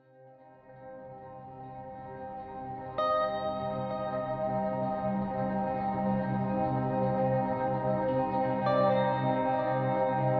Splash BGM; mad crown

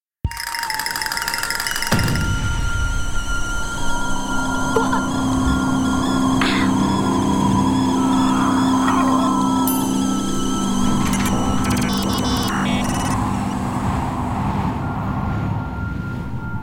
Elevator Scene Score And Foley; Elevator Scene Part 2

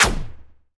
Cluster shot; Blastmorph Pinball; xbox series xs

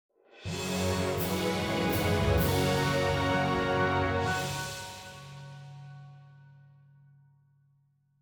Music Ingame Fanfare Final Intro

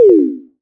Se Cancel; dodonpachi resurrection; xbox 360

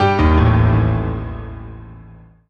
MUSIC EFFECT Solo Piano Negative; startide macos switch windows gamerip 2017

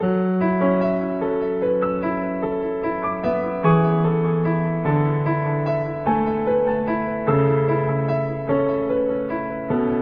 Pool Music; Flan; Apploval

